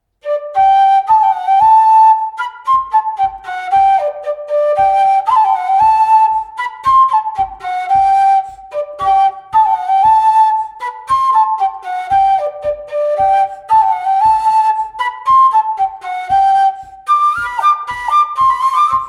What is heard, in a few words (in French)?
Bourree; Recueil Laussedat